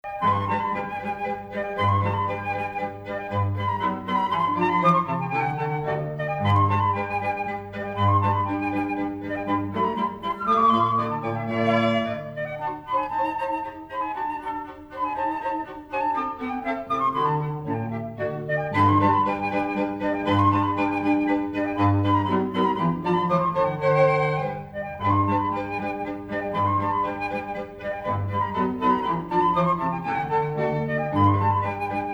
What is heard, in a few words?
Flute, Violin, Cello